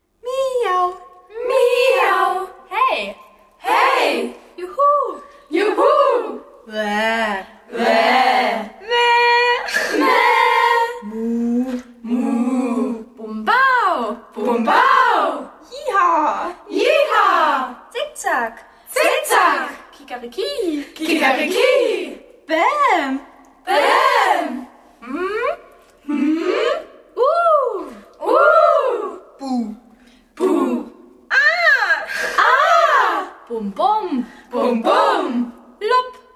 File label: voc kids